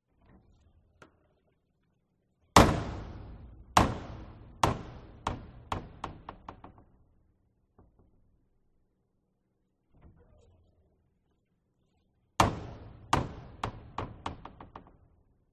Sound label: Single Sound USA Los Angeles Open Closing Fire Exit Door